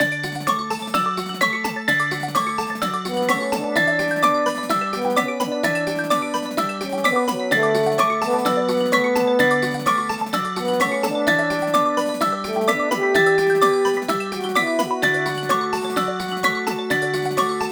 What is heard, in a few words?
Ensemble Instruments; Legend of Zelda, The - Link's Awakening 2019 Remake (Switch)